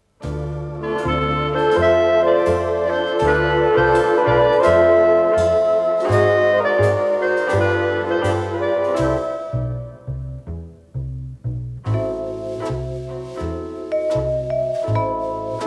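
ensemble of electric musical instruments, mono sound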